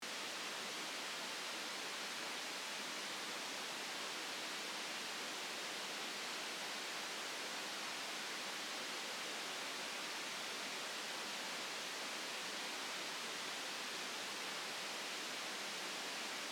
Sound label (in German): Weisses Rauschen